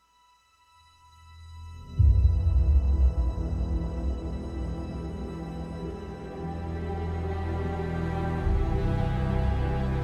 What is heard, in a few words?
Soaring Strings; Musical Sampling